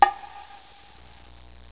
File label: BONGO